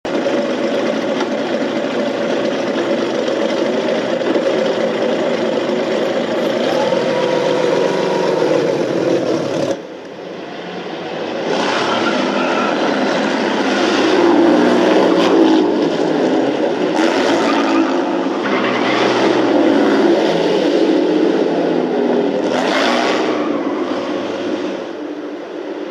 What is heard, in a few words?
Lago-Talbot T26